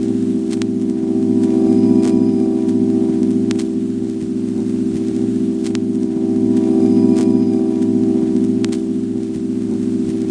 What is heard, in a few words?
scratchy record chord; Pads